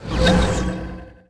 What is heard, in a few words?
teleport; Tony Hawk's Project 8; XBOX